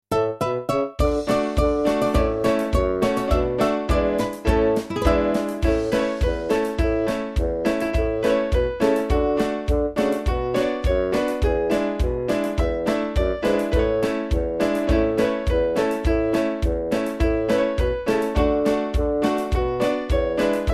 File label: jingle bells, violin